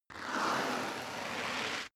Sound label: step boots concrete grit slide long; Footsteps; schritte